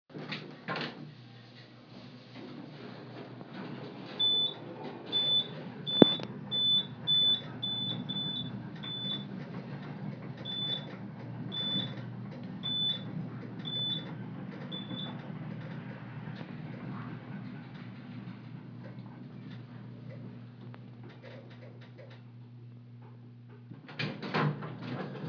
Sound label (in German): chicago grundrauschen